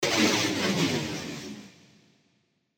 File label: Miss Sound